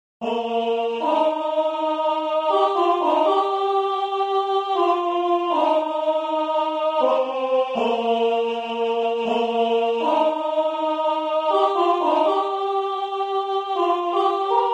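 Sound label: amazing grace coro soprano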